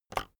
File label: keyboard external enter release